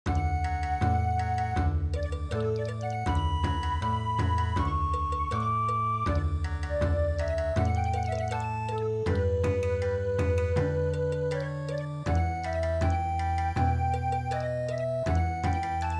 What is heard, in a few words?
Meditation Bon Odori; midi; traditional